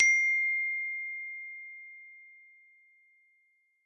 iPhone 7 Plus Notificação

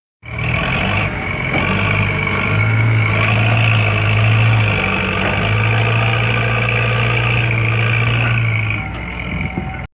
Barulho de Trator

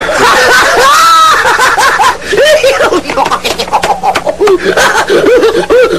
Risadinha; Quico

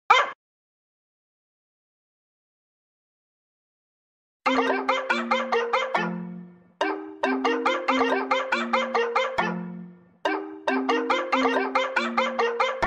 Cachorrês

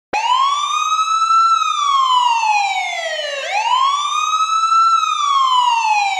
Sirene de Ambulância Notificação

